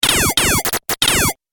Laser Blasts And Charging Up; Laser Gun Sound Effects; Sci-Fi

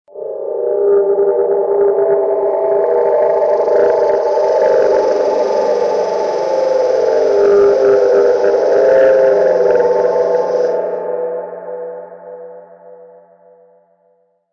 Sweep, thriller-horror ambience sound; Scary Soundscape Sound Effects

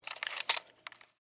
Camera Wind; Cameras; Electronics; BlastWave

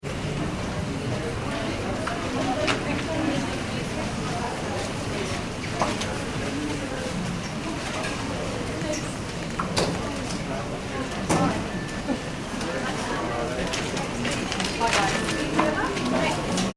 ambience airport passport control hall; Airport Ambience - Passport Control Hall - London Heathrow Airport; Airports; Backgrounds; McKinney Sound

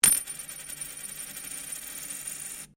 Coin Penny Single Spin Laminate

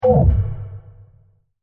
SciFi Impact; Sci-Fi Impact With Spooky Elements; Digital

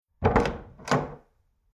Internal Wooden Door Old Open Two Parts; Miscellaneous Door Sound Effects

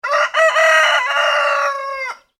animals cockrel crow, Cockerel/Rooster Crow; ' Cock - A - Doodle - Doo '; Birds

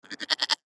Goat Bah Grunt; Farms; Backgrounds; Animal; Blastwave

